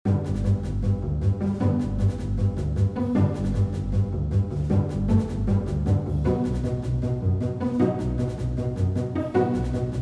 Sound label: Casual Theme (Triumph)